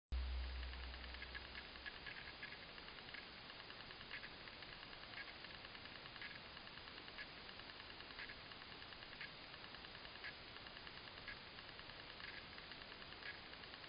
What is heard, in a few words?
lion